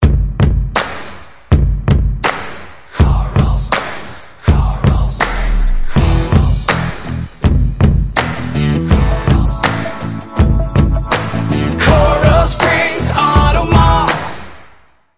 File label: jingles